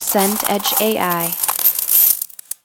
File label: snow compact underfoot